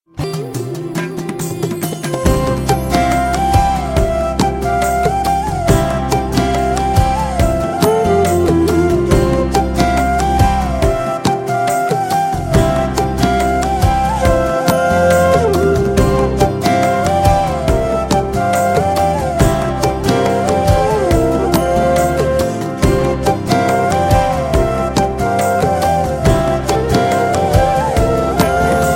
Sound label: Flute; Nayakan